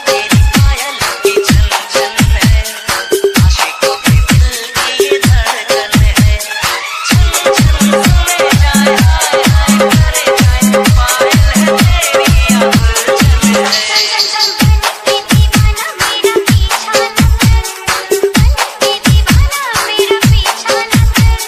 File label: new bollywood ringtone